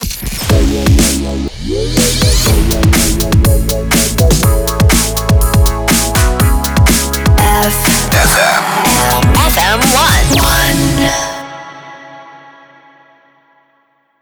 Jingle 122bpm Cm B Ramp; 87.5 FM1 Manila

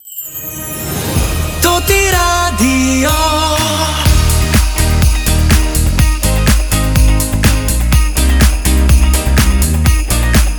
Radio Opener Xmas; Toti Radio

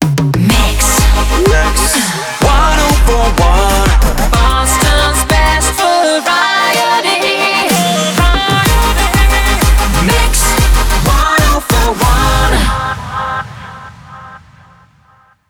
125bpm Ab mix main ID; WBMX

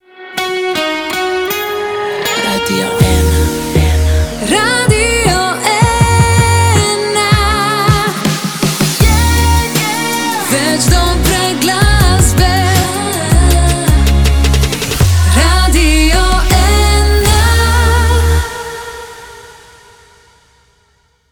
Jingle; Radio 1 Slovenia 2022

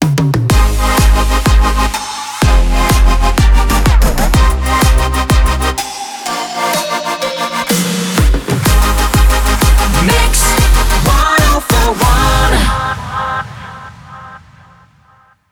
125bpm Ab Ramp; WBMX 2017

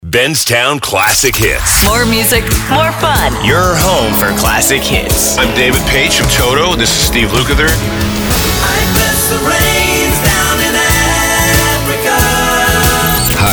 Classic Hits Composite; Benztown

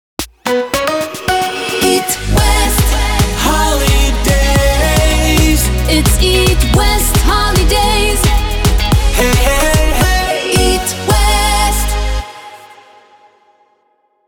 110bpm G MIX; Hit West 2020 Cut

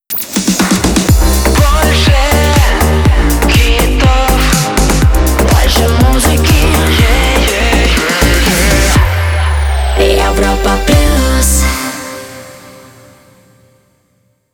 Jingle; Europa Plus 2022

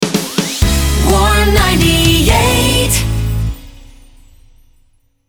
Medium 126bpm D Rapid Fire, jingle; Warm 98

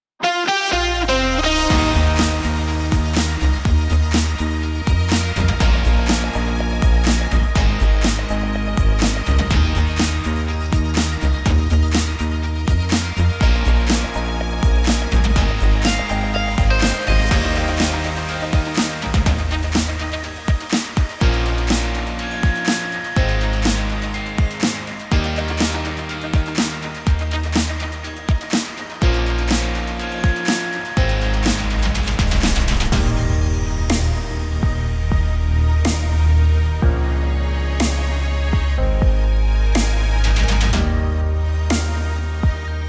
Sport, jingles; Toti Radio

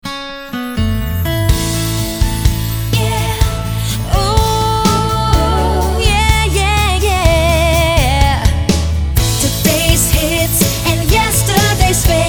Medium Hot 125bpm Main ID, jingle; Warm 98

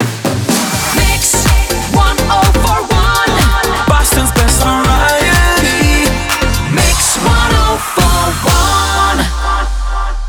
124bpm g MIX A Main ID; WBMX 2017

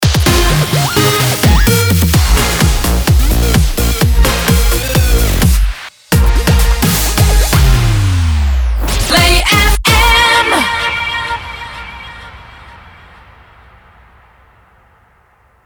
128bpm Bbmin MIX B Ramp

